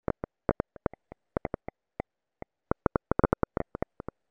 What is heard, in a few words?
crumpling; Continuous crumpling; The NIW Project; NIW: Natural interactive walking